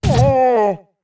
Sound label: Goron Link Fall Down; Goron Link getting knocked to the ground (#3 is rarely heard)